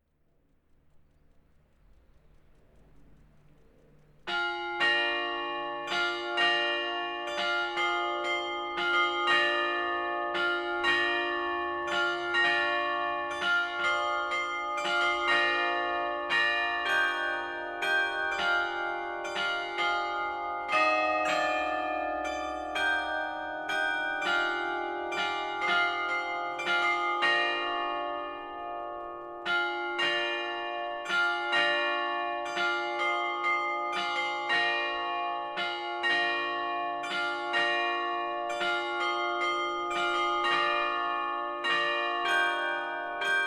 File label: carillon; finhaut